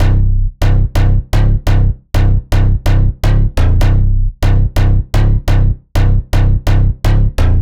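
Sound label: Bass Loop C minor 126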